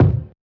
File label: kick; bespoke - Floral v.1; drum oneshots